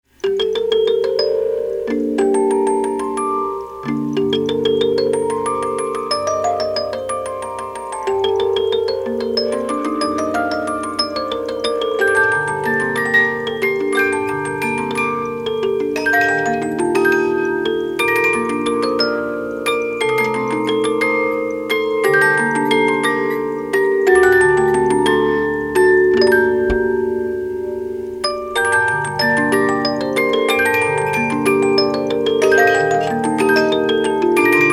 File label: The Moldau 3 Parts, 72 Note music box